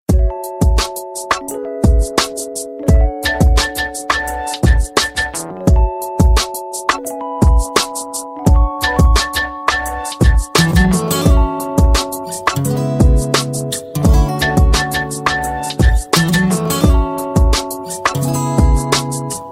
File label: hiphop bass; ringtones